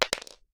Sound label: shell 9mm wood; STALKER; IWP